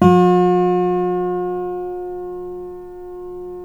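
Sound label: GUILD 12-string, Guitars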